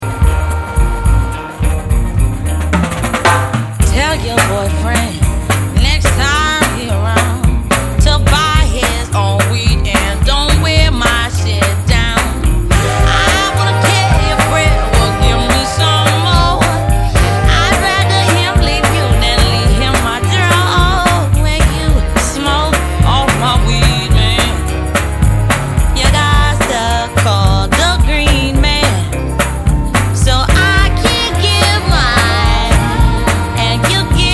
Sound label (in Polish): Disco Przeboje